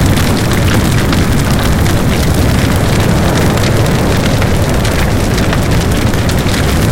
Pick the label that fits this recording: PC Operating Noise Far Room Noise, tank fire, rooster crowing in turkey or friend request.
tank fire